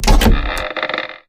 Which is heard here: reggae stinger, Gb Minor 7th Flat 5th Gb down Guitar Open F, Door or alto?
Door